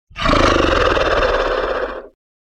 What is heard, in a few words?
Dinosaur - Monster Opens Mouth; Hollywood Edge; sound designer's toolkit v2